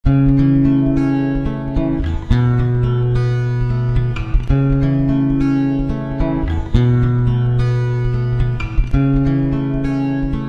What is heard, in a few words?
high, riffs